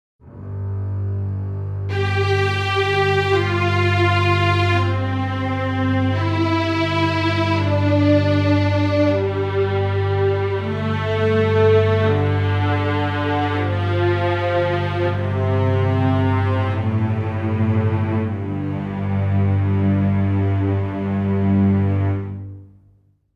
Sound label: String Ensemble